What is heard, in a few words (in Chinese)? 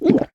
女巫饮用声